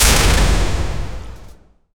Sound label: create a sound that evoke, deep impact